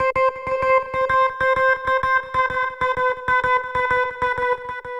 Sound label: 80s Lead; Dr. Fink's Funk Factory